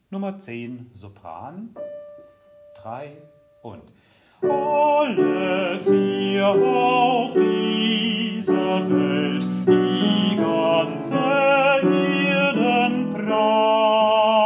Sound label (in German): Sopran